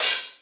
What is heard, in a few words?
Open Hi Hat, Dubstep Drum Shots; Ghosthack; Demolition; Infernal